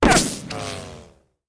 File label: ricochet; dead or alive 2